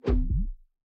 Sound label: Boss Swipe Right; Winkydink